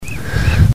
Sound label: Birds Chirping